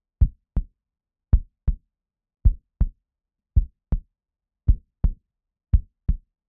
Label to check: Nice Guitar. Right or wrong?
Wrong